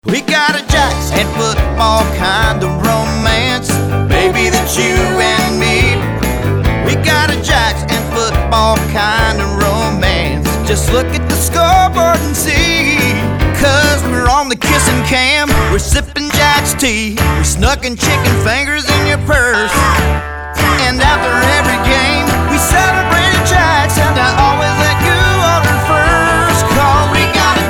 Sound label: Football Jingle